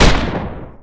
smg shoot giant

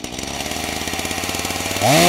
chainsaw spinup

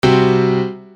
piano ding

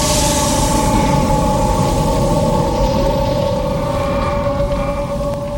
energy teleport; argent

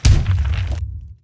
thump; gargantuar; mvm forever

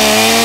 spun up, chainsaw

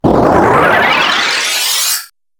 boss charging

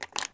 scatter gun reload

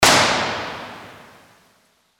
gun

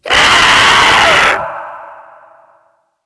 screecher

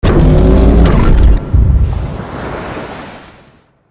waterstep; termination; goliath

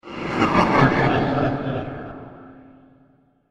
laugh test; mentrillum; mvm

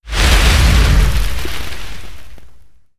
land hit charge; xiao

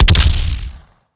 pistol fire

